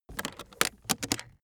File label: aluminium case open close